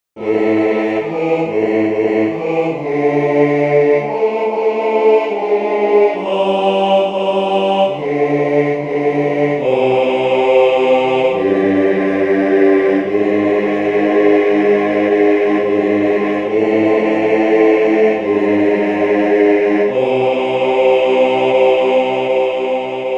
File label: Bass, barbershop tag